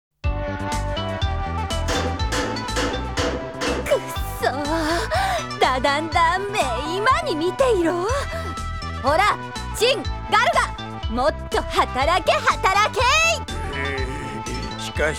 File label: Bonus; CD Drama Kyuukyoku Sentai Da Dan Daan